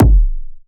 Phase Plant, kick

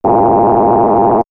Brutal Noise, synth fx; StarWars; X-Static Goldmine

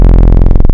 Octave Saw, wavetables; Animoog

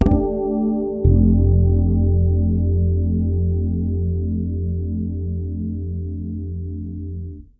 FRET CHORD F, PEDULLA BASS; Masterbits CLIMAX 7 - Bass

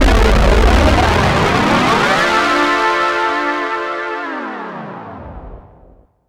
Rise Pad, Afterhours EDM

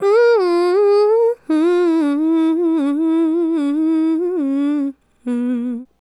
Female Solo, Hip-Hop and RnB Vocals

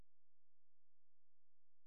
Strings Section, Pad; BrightnessPad

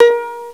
V PIZZ HI, Fairlight CMI, STRINGS; STRINGS3

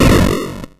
Crushed Perc, bitcrushed; musicradar

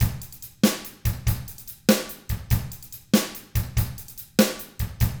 rock 6/8, drums; ILIO; Double Platinum Drums 1

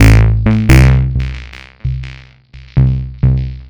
Synth Ultra Bass

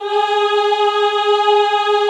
EHH G#3, Classical Choir; Best Service

